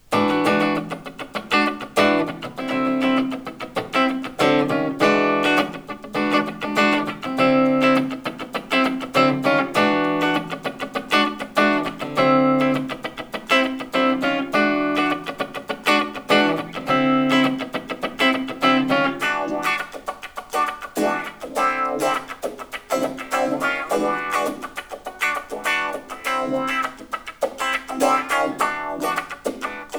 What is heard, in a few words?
autowah